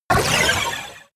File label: sci-fi laser shot long; krieg-waffen; soundpool